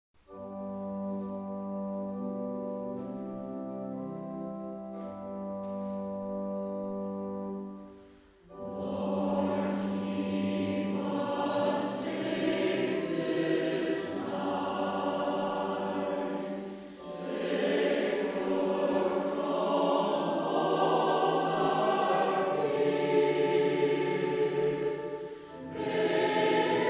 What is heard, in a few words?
Lord keep us safe choir